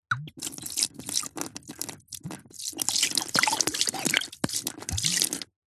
food drink beer pour tip out from glass bottle onto ground; zapsplat